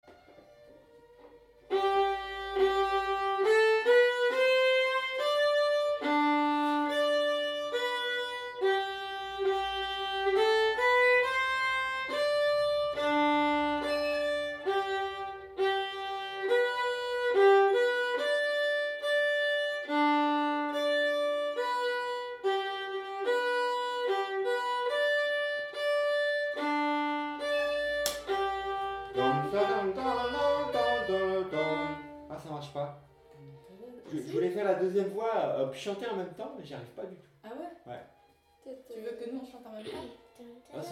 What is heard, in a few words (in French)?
scottish tous 2e voix et chant marrant; violon